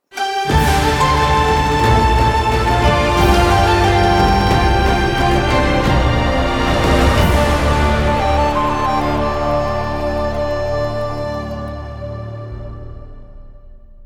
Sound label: win